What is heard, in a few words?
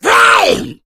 hunter pounce; source engine; left 4 dead 2